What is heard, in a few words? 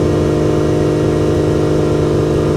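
Truck Cherokee Loop; SFX Kit; Trucks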